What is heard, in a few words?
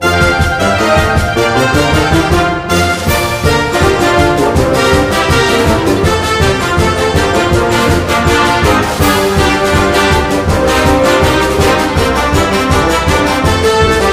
fight ringtone; alabama crimson tide